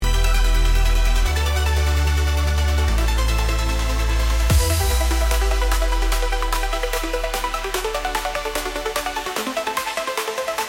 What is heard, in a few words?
urban sparks, dlya raboty